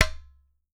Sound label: UTAR, Definitive Percussion